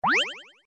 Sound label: scuttlebug hop; Звуки из игры Марио